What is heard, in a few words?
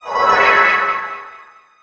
summon casting